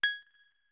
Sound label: hover